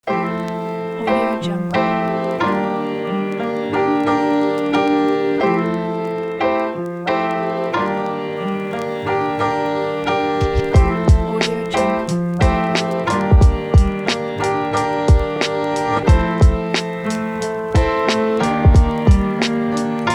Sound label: lofi hiphop